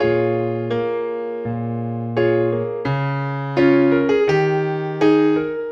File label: Piano, Trip-Hop Loops; Sonic Foundry (Sony Creative Software); Rondo Brothers Trip-Hop Loops & Samples; Hard Rocks The Roof